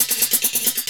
Echo Hat, Drums & Percussion; Sonic Foundry (Sony Creative Software); Futurist Drum & Bass

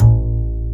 UPRIT BS A1, WOOD BASS

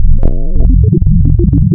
Add Sine Multi, wavetables; Architecture 32

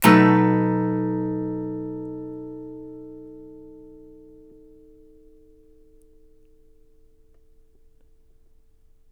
CHORDS, Blues Guitar; ILIO; Fretworks

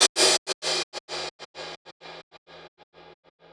Gated Cymbal; musicradar

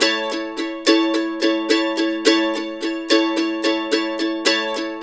RIFF, Blues Guitar Samples; ILIO - Fretworks

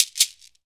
SHAKER, Afro Shakers, Shake&Rattle; Roland LCDP14 Africa VOL-2

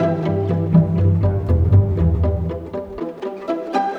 Strings Pizz, Cinematic; Sonic Foundry (Sony Creative Software); Orchestral Series VOL-3

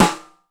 DRY S, drum; Crypton; DrumBase5000

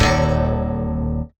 guitar stab

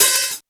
Roland U220 Electric Set

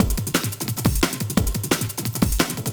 With Punch 175, Breaks, Drum&Bass; EZRollers

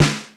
rim; MPC-60 DRUM2; LIVE; Greytsounds VOL-1

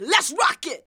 VOX VOX; Sound & Vision - Gigapack II CD 2

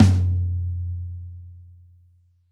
Rock Tom 47, Roland BK-5 Toms

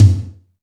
POW TOM, ACOUST TOMS; Greytsounds

